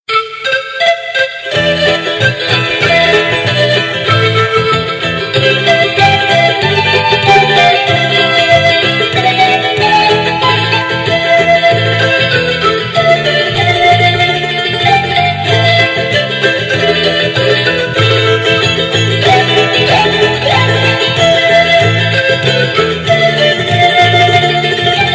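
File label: flejta, flute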